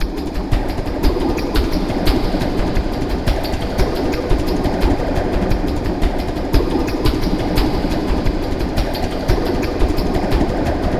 SOLAR WIND GROOVE

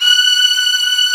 STRINGS F#6; ORCHSTR; AKAI S-Series CD-ROM Sound Library VOL-2

